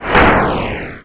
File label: damage; protectTheRoom